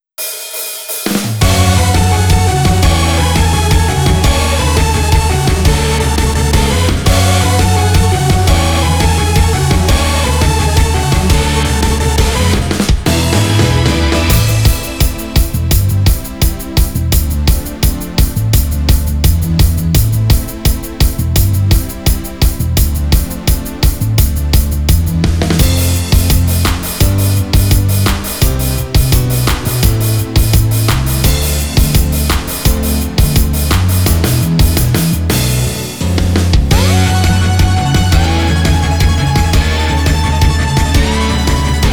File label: off vocal; PlatonicAngels洗脑计划 VS早乙女有纪篇; KU100